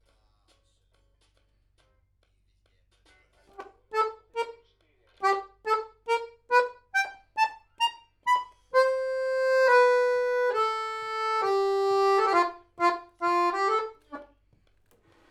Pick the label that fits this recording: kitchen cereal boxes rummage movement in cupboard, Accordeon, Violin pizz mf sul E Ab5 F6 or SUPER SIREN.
Accordeon